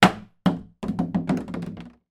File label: household empty plastic watering can land hard ground from height bounce; zapsplat